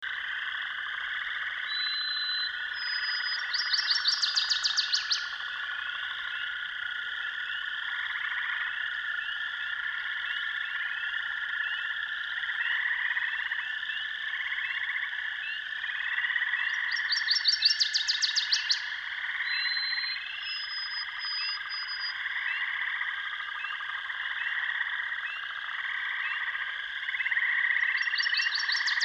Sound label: white-throated sparrow